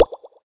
hold bubble unlock; SKY IM-A910S-VEGA-Iron-2

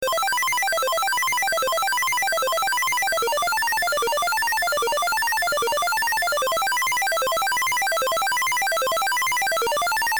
MONO waves, Ripples; Nokia; Monophonic

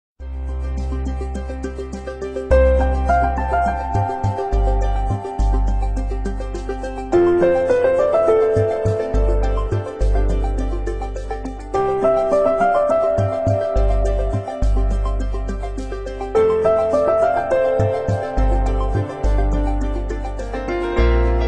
Tune; Telenor

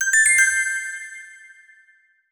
Text Mess; X1 Fam2 Text Mess 3A (luXury); Sony Ericsson; PlusFourSix; Venus (Xperia X1); X1 Family 2

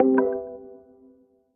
traffic report ding dah; Samsung Galaxy S10; Maps

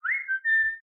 Whistle

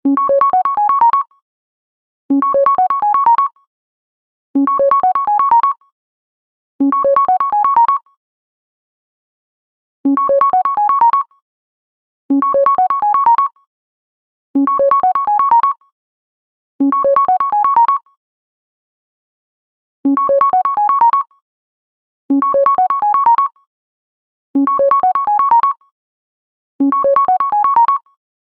simple bell, ringtones; LG V30 H930

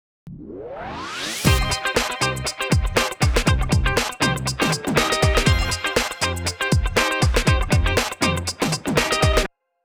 Dala Guitar, ringtones; Motorola Moto-G20; lenovo

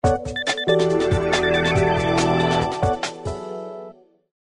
Message tone

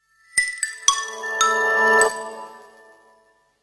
Start-up tone, Nokia; 206; Alert tones

